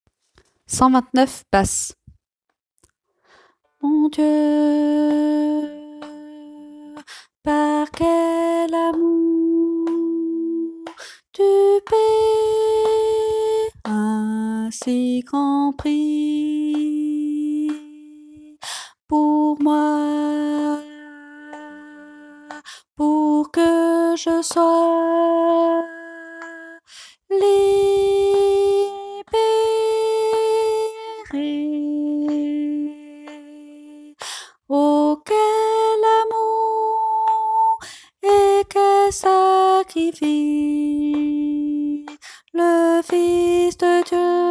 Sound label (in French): basse